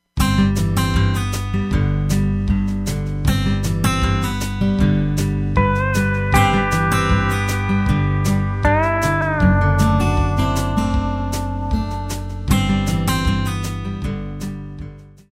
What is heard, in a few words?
Guitar